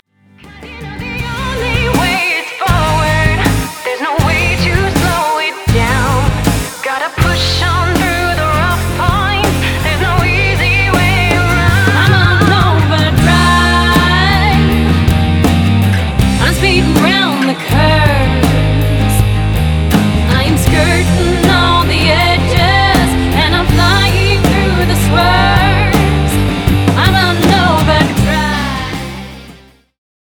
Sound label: Overdrive